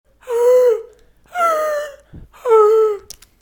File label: hyperventing